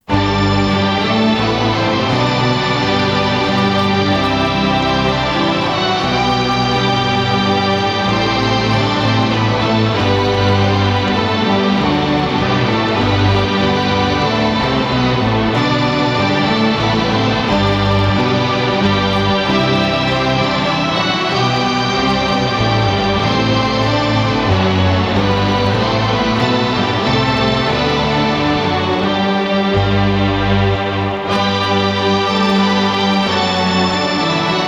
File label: Minuet in G guitar synth; Bach JS; hanson guitar synth